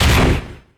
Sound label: Ball Create